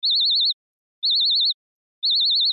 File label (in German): frequenz 4000; hoertest